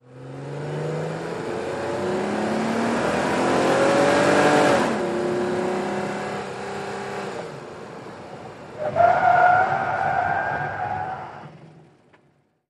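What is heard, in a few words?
SUV Truck Accel Skid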